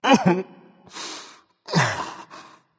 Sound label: boohoo berserker; cuchulainn